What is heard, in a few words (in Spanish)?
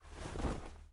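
SOTANA, cassock; yesterday origins gamerip 2016 switch ps4 pc ios macos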